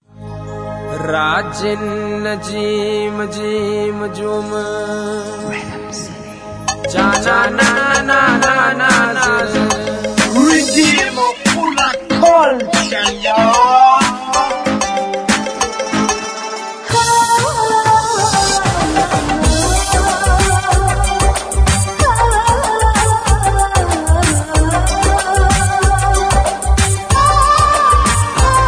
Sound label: Smooth Indian Mixes A sweet blend of lovers hindi songs